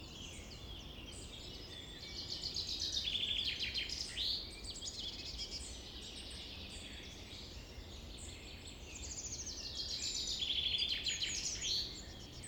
Forest Summer

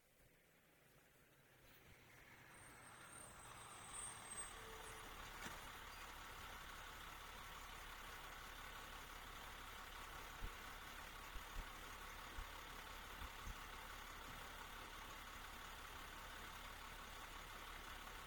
Vehicle School Bus Stop Sequence

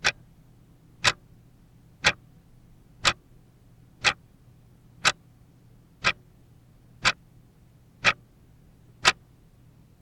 Clock Ticking

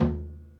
Tom Tight Low Vol

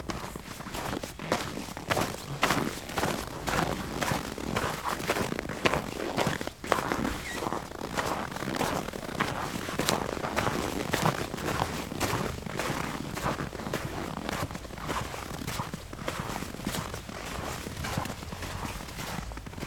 Frosted Snow Steps

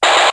Long Mic Static

